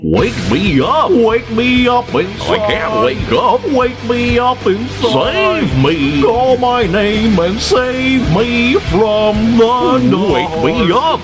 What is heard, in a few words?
wake me up goofy song